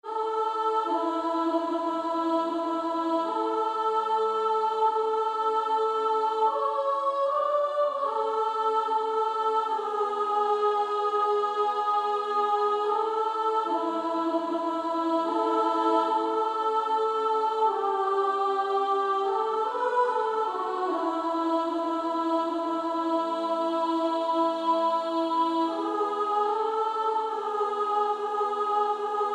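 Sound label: Female Choir, soprano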